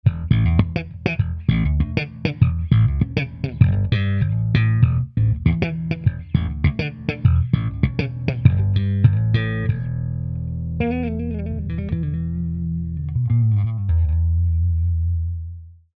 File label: slap stick; jensentone